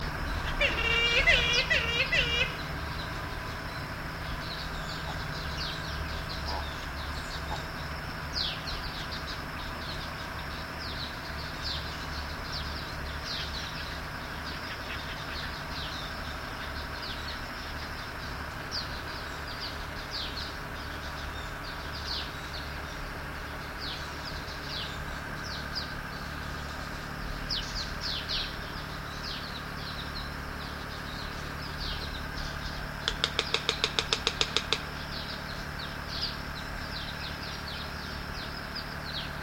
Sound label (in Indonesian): cikalang christmas